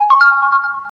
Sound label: SMS; Realme Pad 2